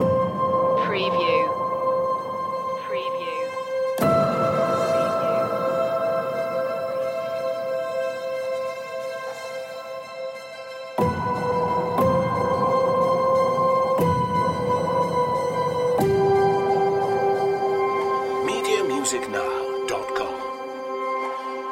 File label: a new movement loop